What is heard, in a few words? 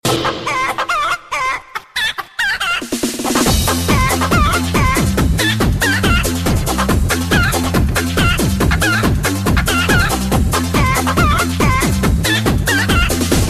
funky chicken